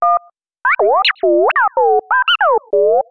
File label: Wavering Dialing Beeps